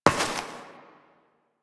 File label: Step Metal; Warframe; Koumei